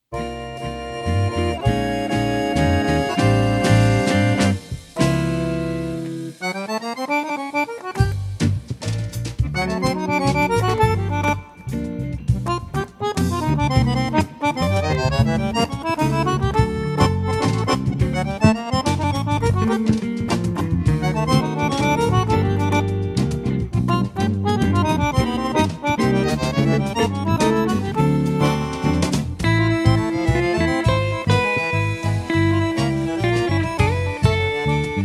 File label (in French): valse; carapate